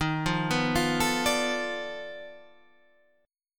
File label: B 7th Sharp 9th Flat 5th, guitar